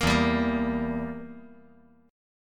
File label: E Diminished down